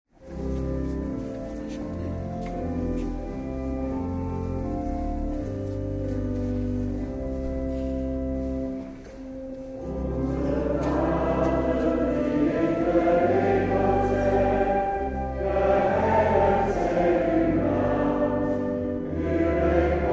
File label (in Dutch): Onze Vader